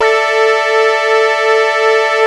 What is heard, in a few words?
AQUA TARK, Synth